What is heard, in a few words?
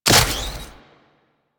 Hunter Crafting Equip, UI; Warframe; Cetus